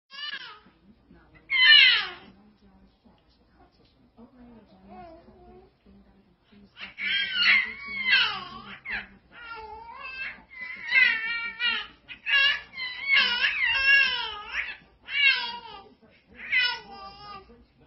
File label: Baby coo